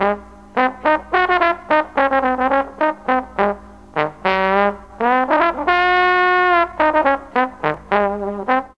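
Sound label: D4 D BONE, mellotron trombone; DIXIE+TRMBN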